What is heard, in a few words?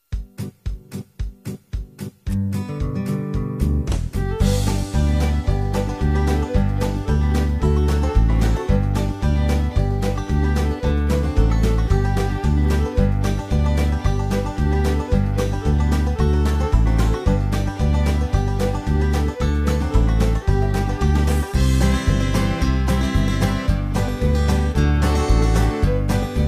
Oh Susanna instrumental